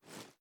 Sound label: reload empty fast xmag arm; gmod